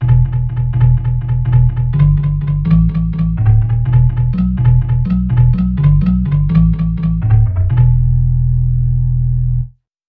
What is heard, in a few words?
percussive organ bass